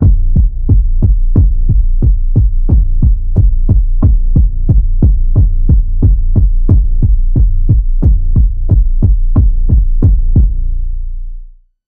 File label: Underwater Pulse Wave A sharp